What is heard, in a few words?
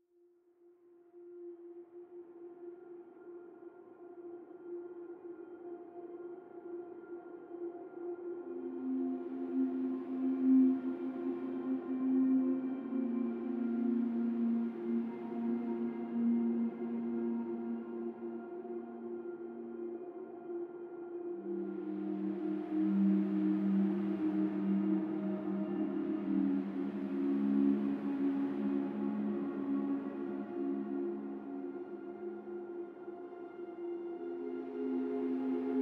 Instrumental Version